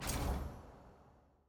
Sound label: trans archive modal exit click